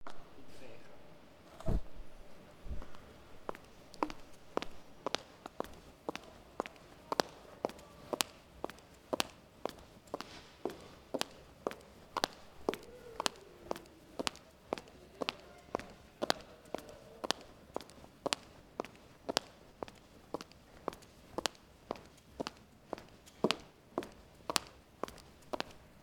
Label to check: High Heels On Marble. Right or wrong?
Right